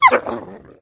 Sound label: fox damage, monster